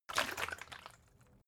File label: bottle water big shake single; berufe